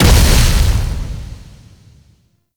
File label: Hazard Pyro Start; Gameplay; Stadium; Warframe; Nineteen Ninety Nine